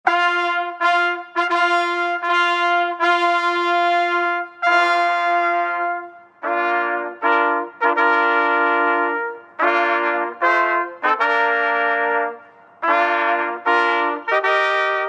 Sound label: Wagner Bridal Chorus